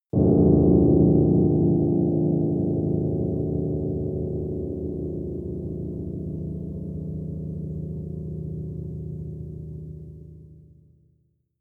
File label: piano deep